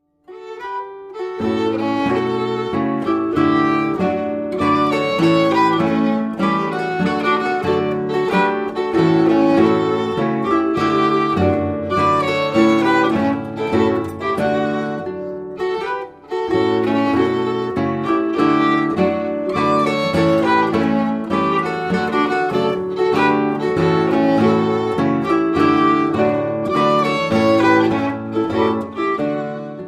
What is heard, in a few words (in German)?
Original Alpen Melodien